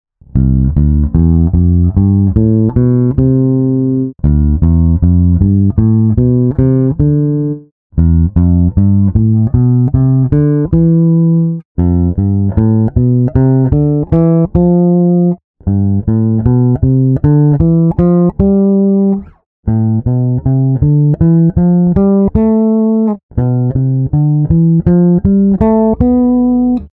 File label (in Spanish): modos griegos en bajo